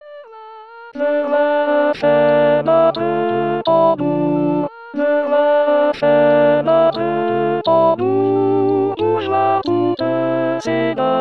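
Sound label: alto; leroi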